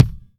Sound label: Kick